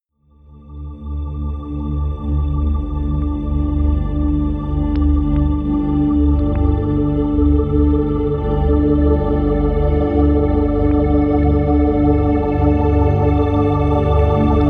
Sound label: promo pad